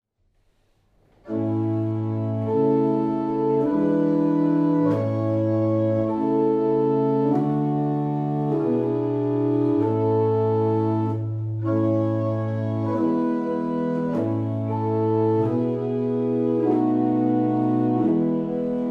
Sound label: Tiverton, barrel organs; Steeple